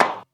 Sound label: Claps & Snares